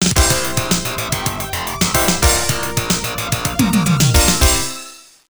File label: Stage Clear; arcade